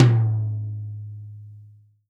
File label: TOM, Real Drum Kit